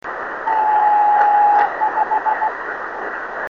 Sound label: 5 dots 26890KHz